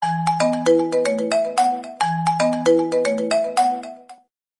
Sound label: huawei mate 10 ringtone